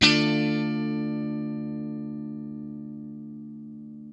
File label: CHORD LN 7, guitar; Quantum Leap - Guitar & Bass